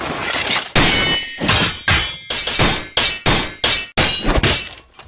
sword fast fight

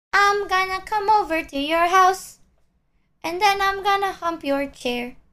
little jingle

